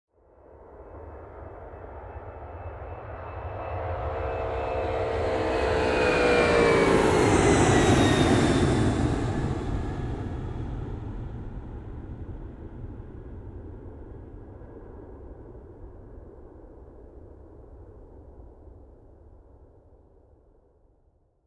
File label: Aircraft Lifting Off Up-close